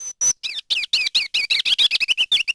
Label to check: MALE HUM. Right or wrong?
Wrong